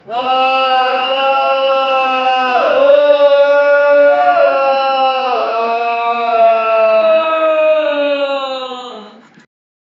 sound of a lots poor, distress

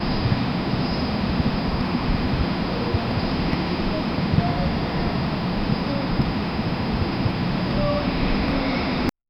ambient sound of an empty

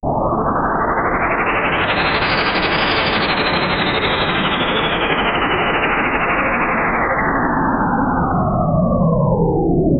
spaceship flight in pixel

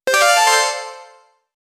game level finish sound; achievement

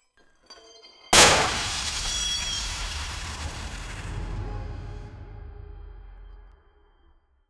thick glass shatter perfu, perfume spray

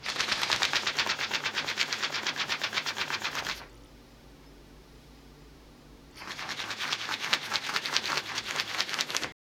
paper

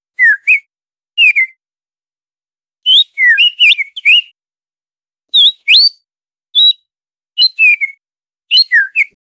a small robot letting out, whistle

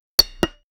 cricket ball striking bot

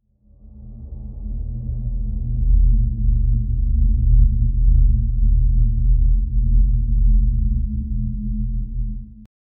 dark emotional tension, tension

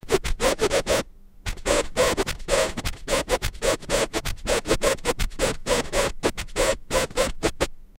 quick record scratch during a dj set

